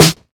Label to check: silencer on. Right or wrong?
Wrong